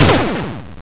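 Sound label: shot assault rifle; svencontra2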